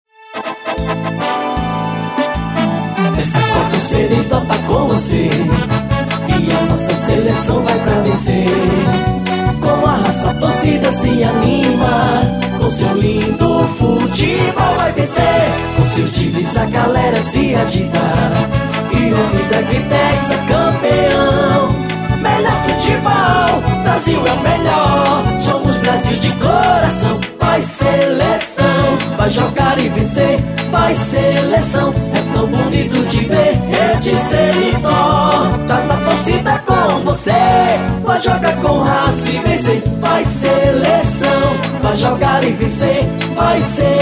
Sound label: jingle; copa rede serido